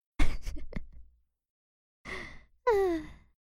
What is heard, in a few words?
laugh